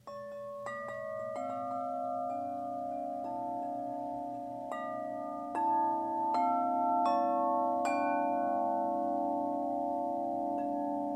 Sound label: Court Haus 62in music, metal wind chimes; Ultimate series